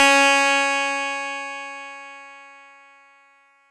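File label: Fuzz Clav DX100 C#1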